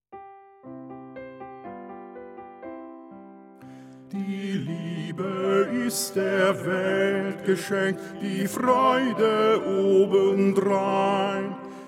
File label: TTBB Tenor2; maenner